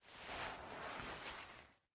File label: Move Bed Sit; Game rip; MiSide